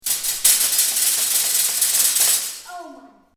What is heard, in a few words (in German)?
Wäscheständerhauen